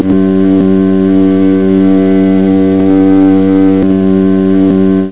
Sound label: FOG